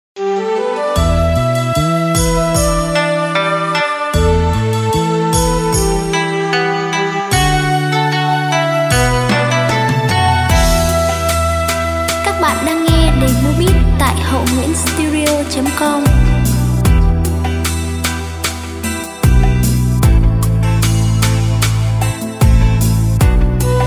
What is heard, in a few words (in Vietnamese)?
beat